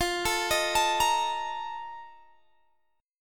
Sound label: F 11th F, down, Guitar Open G